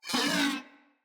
Moa Vocal Pain

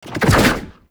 Infested Lich Mindhack Whip; Warframe; Abilities; Zeke

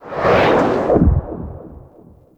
Aftertouch Engage Stop; Warframe; Ranger

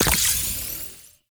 UI Mod Hold To Fuse; Mods

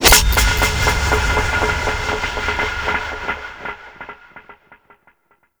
Cave Figurine Assembly; Warframe; Duviri; Teshin

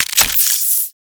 Modular Primary Handle Reload, Guns; Warframe; SU Modular Guns; Reloads

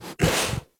Kubrow Tame Interact Sniff

